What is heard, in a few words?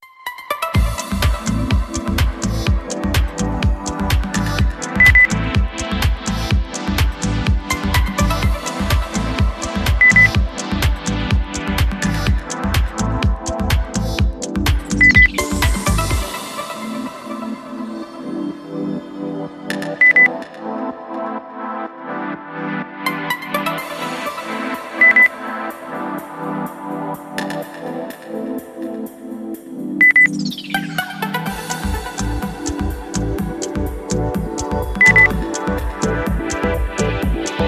lounge